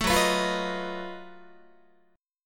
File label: Gb Minor Major 13th Gb down Guitar; strummed; JGuitar